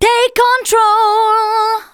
VOCALS SUNG; Techno Trance Essentials

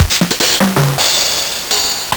Nintendo Gameboy LSDJ DMX

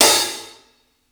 60s OPEN HH, HI HATS; Basement drums vol 2

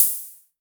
Hi Hats Open; LIMAT Div Dept

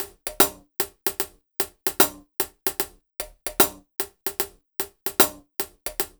HH, Ragga & Dub Reggae; USB Soundscan vol.27; AKAI

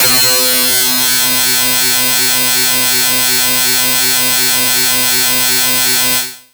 Factory, FM; Polyend

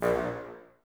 BNS A#1, Bassoons, Wood Winds; Miroslav Vitous - Woodwind Brass Ensemble; Bassoons Links

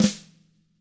FLM, TAMA SNRD, Drums; ILIO; Double Platinum Drums 2

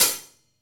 Rock Closed Hi Hat, Roland BK-5

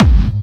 hard bass drumz

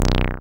TB C2; Best Service Gigapack 1; SYNTB303

